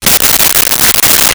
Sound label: Crinkle Index Card; Office Supplies